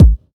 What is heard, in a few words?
natural kick single shot a key, Royality free kickdrum tuned to the A note; kick drum samples